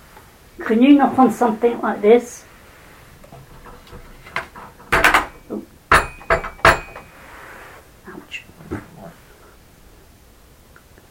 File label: knocking